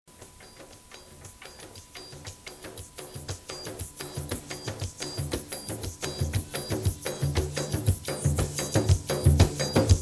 instrumental tipik

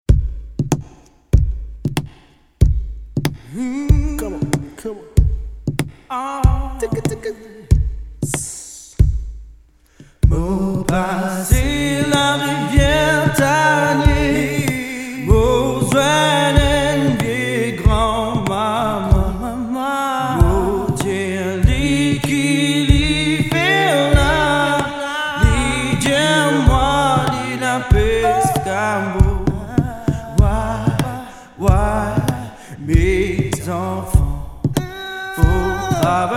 Know me Acapella

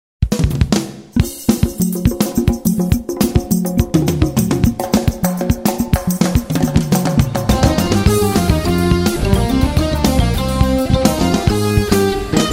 Séga SEGA

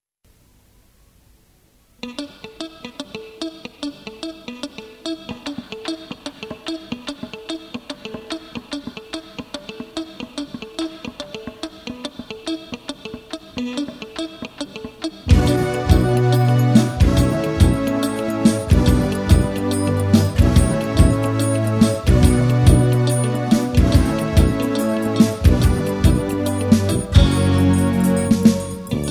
SEGA SEGGAE

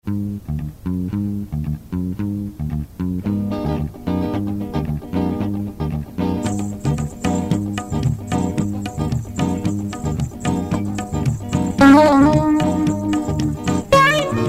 instrumental

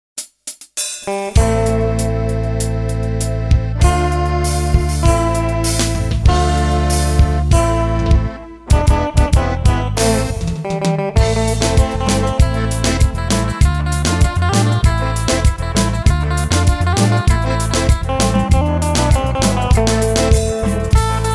Akoustik

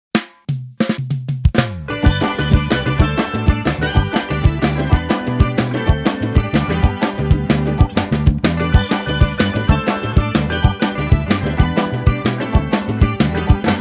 SEGGAE

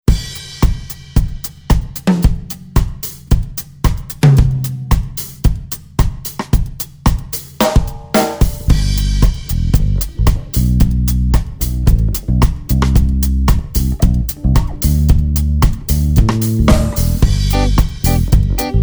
Ti Refrain Magic Instrumental

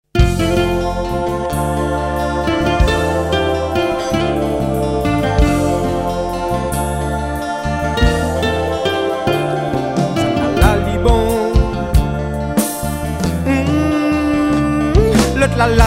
SEGA; KILTIR MAURICIEN

